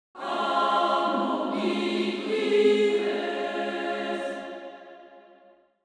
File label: Angelic choir angels harmony prevails